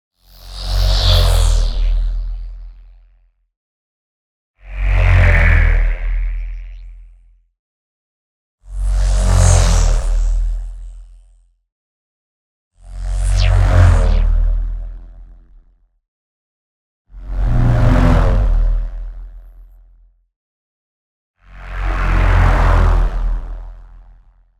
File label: NEON WHOOSH LARGE SLOW Magnetic Fly By; boom library